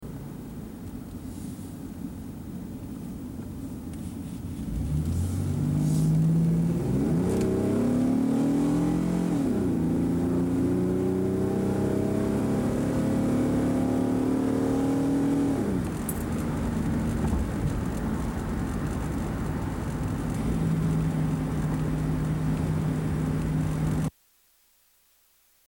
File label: accelerating